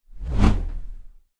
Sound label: Swoosh Punch; Frogsong